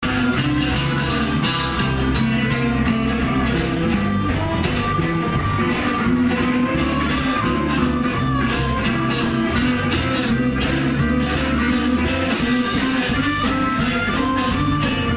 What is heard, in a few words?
harp